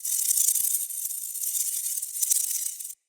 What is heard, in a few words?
Animal Lure Generic Call